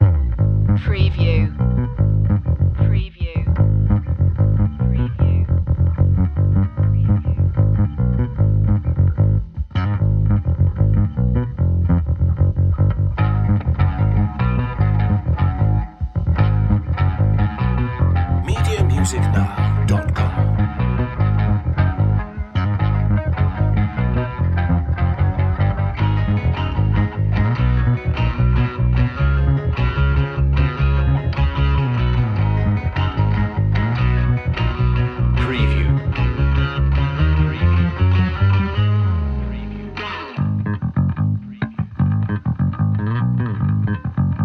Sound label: crazy ride no drums